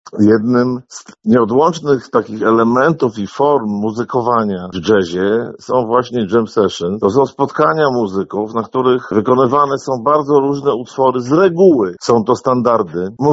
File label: jazz; Studenci UMCS koncertem uczczą światowy dzień jazzu; Studenci wydziału Artystycznego Uniwersytetu Marii Curie-Skłodowskiej